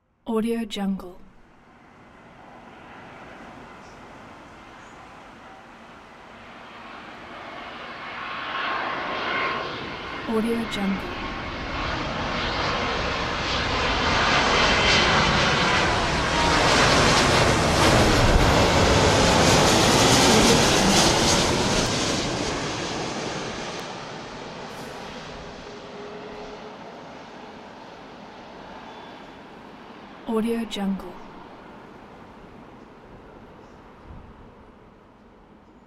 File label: passenger jet flys overhead and lands; hollywoodedge